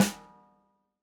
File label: snare, gretsch kit